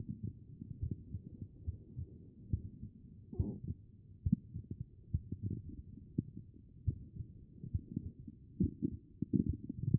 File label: HEART; B MODE